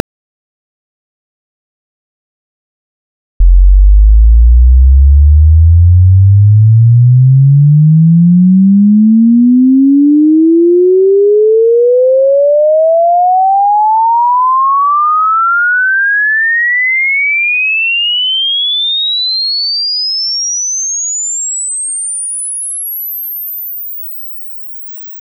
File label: Test Tones, 24 second with fade; SONY.SOUND.FORGE.V8.0; Acoustic Mirror Impulse Files